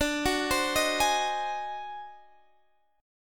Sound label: D 7th Flat 9th Sharp 11th; arpeggiated; JGuitar